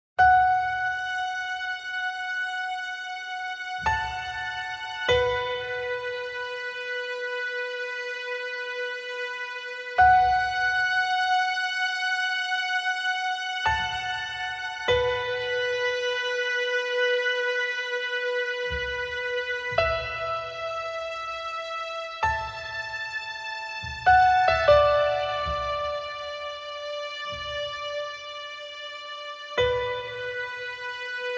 Piano and Strings

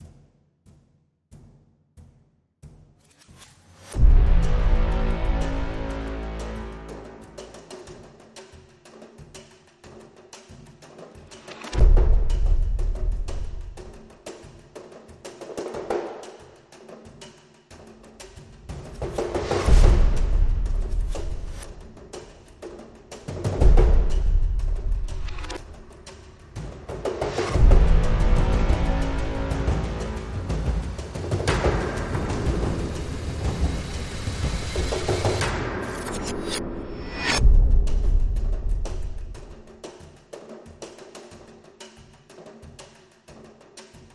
Drums FX

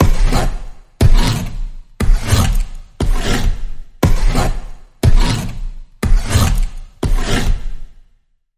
Ice Clash Pulse

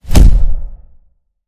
Punch Whoosh Hit

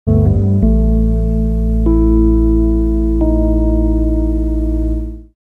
Rhodes Song

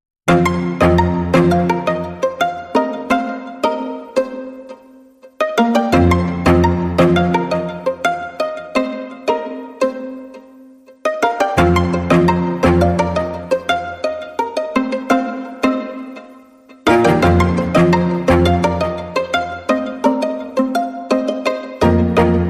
non stop strings no legato

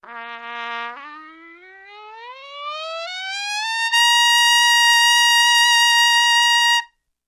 Trumpet Long Gliss Up

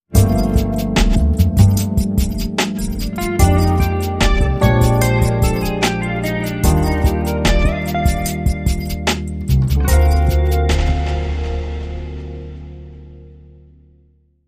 Guitar Chill

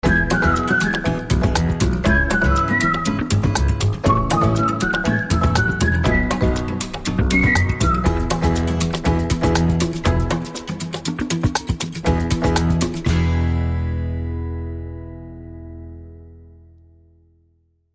Whistling Jack

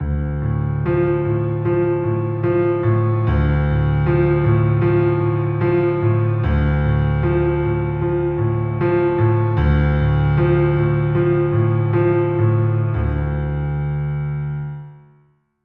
Piano

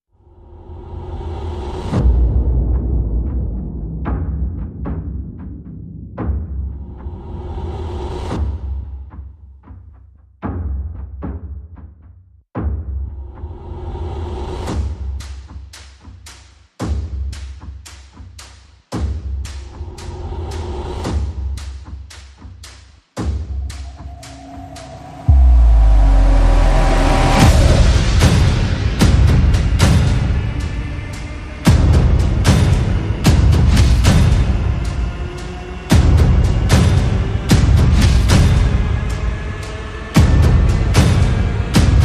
Perc And FX Only

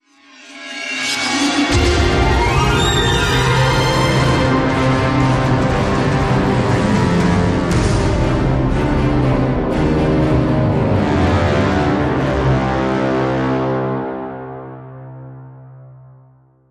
Epic Sting

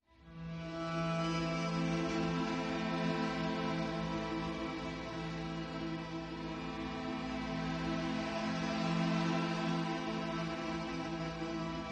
Middle Strings Only

